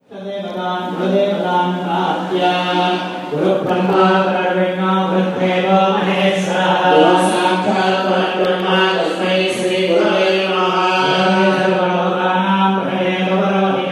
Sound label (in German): Hindutempel